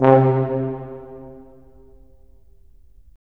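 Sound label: brass tuba; LA Composer vol. 3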